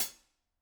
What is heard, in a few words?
room Cl Hat, drum